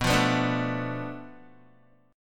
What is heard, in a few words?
B Minor 7th Flat 5th B, Guitar; strummed; 4E,4A,5D,5G,5C,6F; 7,8,9,7,9,x